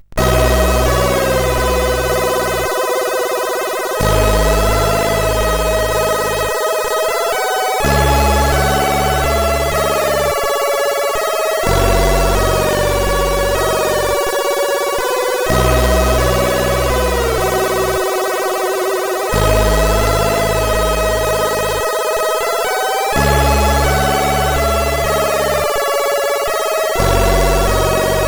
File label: CSG8580; sid CSG8580R5